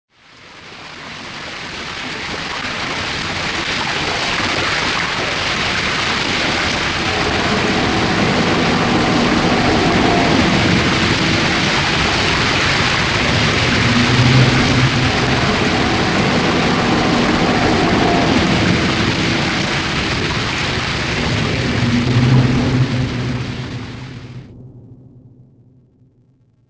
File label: water; Soldier Of Fortune II Double Helix; XBOX